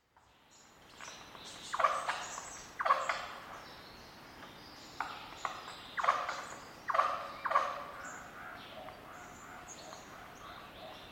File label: lyrebirds squaking; soundscapes